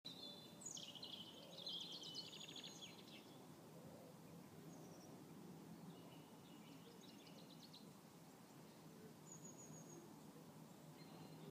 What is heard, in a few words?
sounds of spring at ashridge buckinghamshire